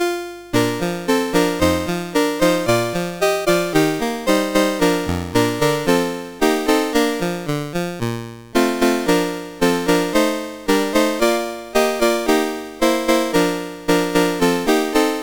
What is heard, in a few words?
Grandfathers Clock, CSG8580